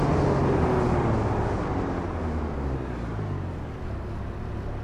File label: throttle off; vehicles; c32; TDMCarpack; TDMCars